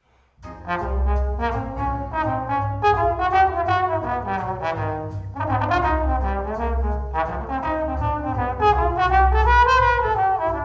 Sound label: Jazz Etude in A Flat Major; Mark Nightingale